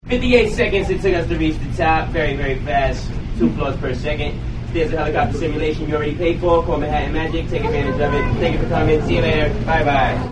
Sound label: lift; usa